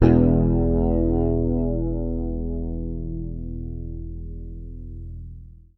E, Bass; Sampleheads; Will Lee Bass Library

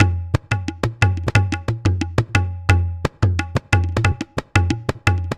Tabla 89 C; Percussion Elements

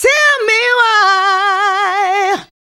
FEM HO, Female Hooks; Sound & Vision - Gigapack II CD 1

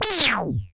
FX Analog; Sound & Vision - Gigapack II

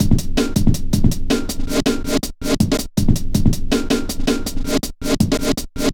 metallic stepz, drum loops

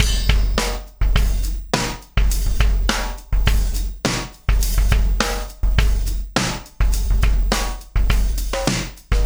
Beat, Processed Drumkits; Event Horizon

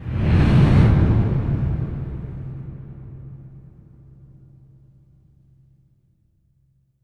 impact flame, Cinematic Impact

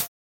Hat Closed; Sonic 3; M8; Genesis

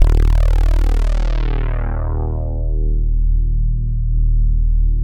ROLAND JX8P poly Eb1; Big Fish Audio; First Call Vintage Keyboards

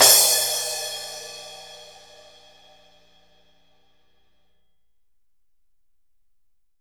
CRASH14 FF, Drums; Big Fish Audio; Ross Garfield Drums VOL-2; TOPEKA KIT

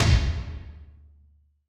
Tomb Slam, Alesis D4

